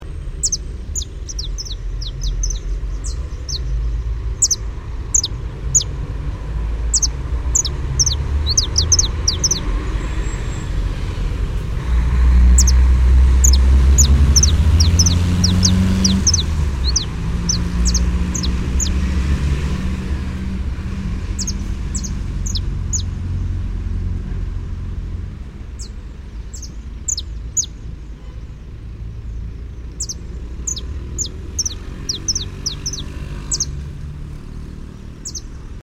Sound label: sicalis flaveola; curitiba